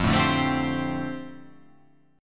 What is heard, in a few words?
F 6th Add 9th F down, chord